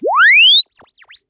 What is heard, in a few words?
Press Two Sides Chirp; Samsung 4 Recordings